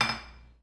Platos

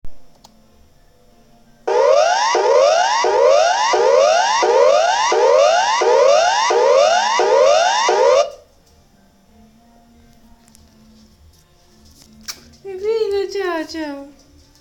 Alarma

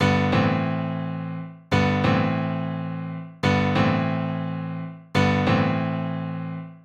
Pianos Y Teclados

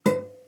Pestaneo Ruidoso

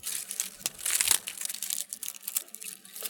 Sonido Ramas Madera Rotas

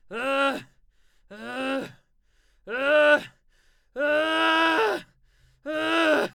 Gritos De Dolor

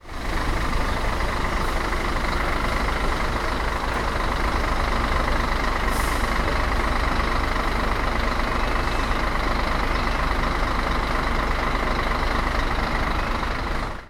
Camion Obras

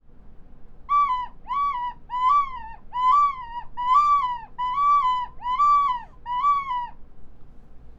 Gaviotas

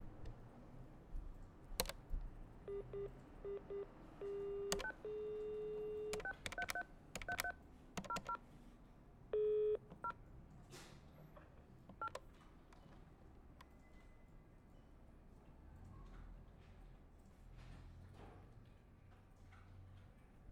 Sonido Telefono

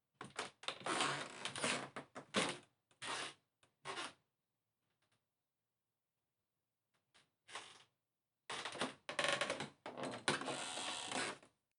Piso Madera

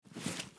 Ropa

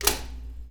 Tostadora

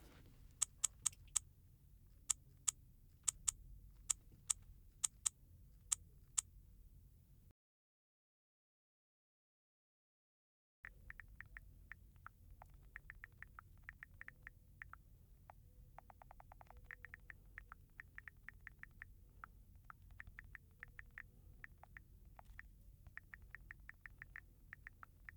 Audio Teclado Celular

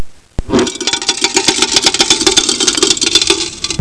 Traga Monedas

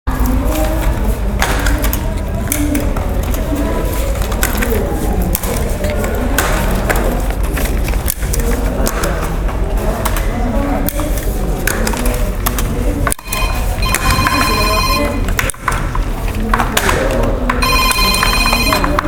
Oficina

